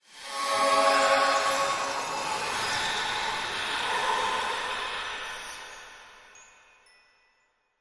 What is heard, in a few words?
particles Appearing; halloweenjack